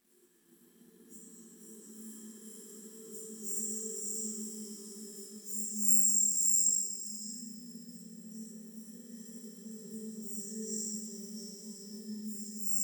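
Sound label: Splashes Fountain, Chalice Well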